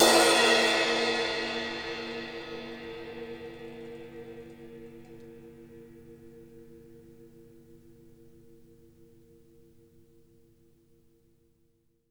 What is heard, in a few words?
MEDCRSH, CRASHES; Sampleheads; New York City Drumworks VOL-1; KD CRASHES